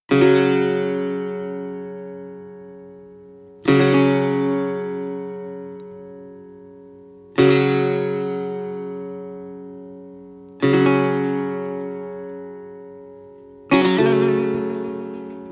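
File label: Taknavaziye Setar, setar solo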